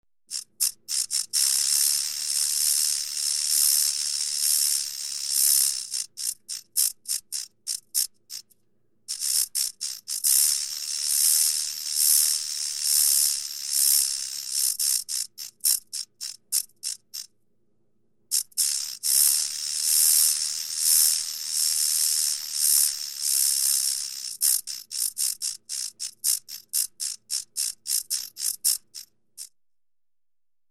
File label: Dragonfly wing-flapping sound